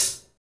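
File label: HH, hi-hat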